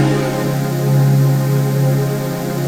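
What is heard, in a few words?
trance pad; Best Service; XX Large - Pads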